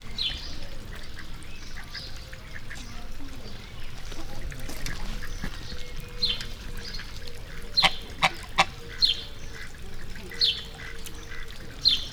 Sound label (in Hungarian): a bufé közelében magyar lúd házikacsa, házi veréb; allathangok; veszpremizoo 2013